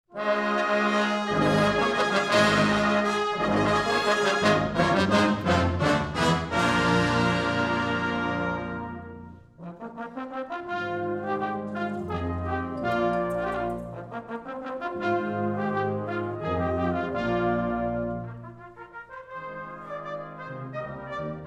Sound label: Fanfare, brass band